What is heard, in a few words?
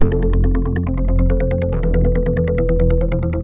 Synth Poly; TSMtoolbox